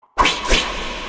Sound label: notification